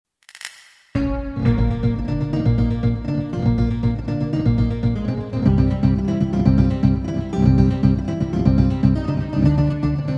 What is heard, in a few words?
Spanish Dance